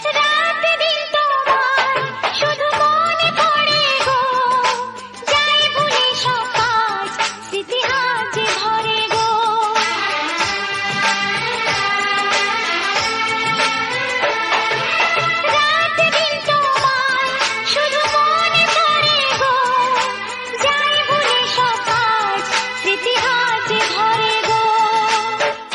Bengali Love Song Ringtone

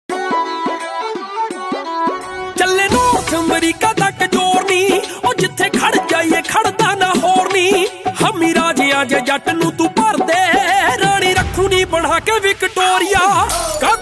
New Punjabi Song Ringtone

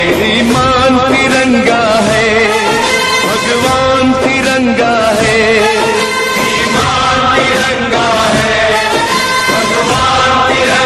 Desh Bhakti Ringtone

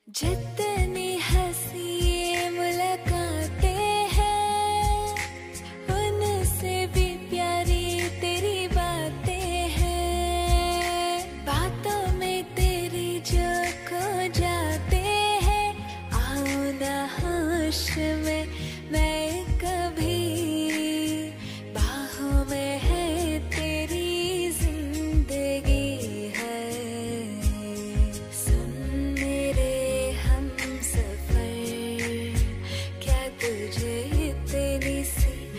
New Hindi Female Ringtone

